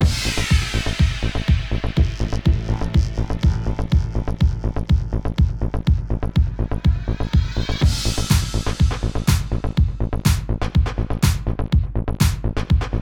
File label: Techno Pop Mix